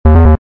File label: gamble lose; Queen Of Nile